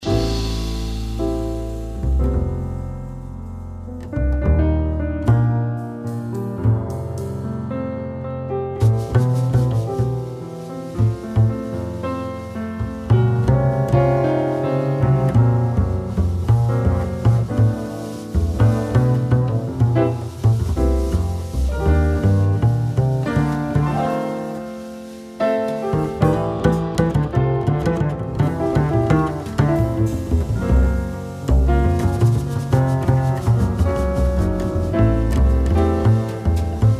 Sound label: Bass Solo